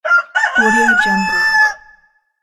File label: rooster